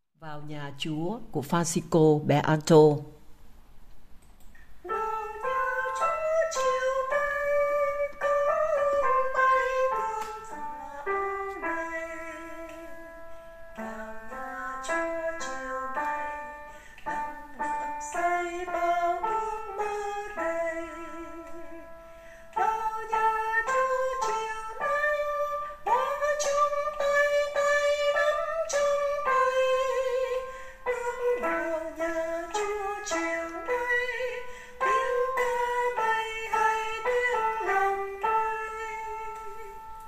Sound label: Alto; ca doan teresa